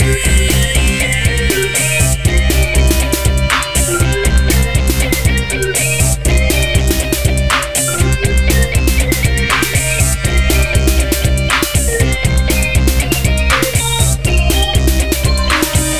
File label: Music